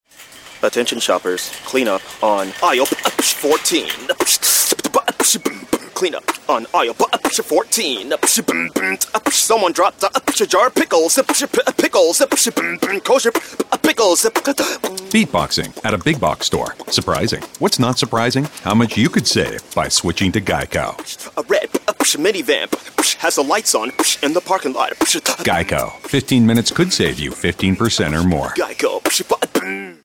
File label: beatboxing